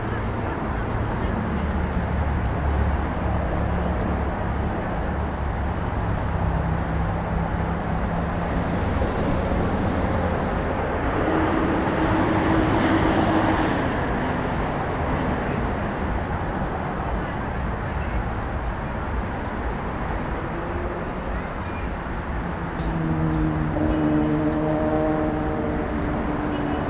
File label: Urban day traffic people busy; urban and suburban; boom library